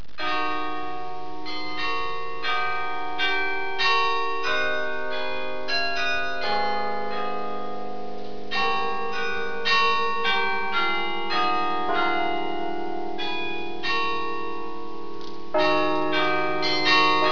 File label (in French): carillon; Carillon Sainte-Croix 06; Carillon de Saint Amand les Eaux; Françoise Delesalle